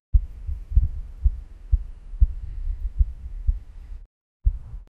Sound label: down faint heartbeat; city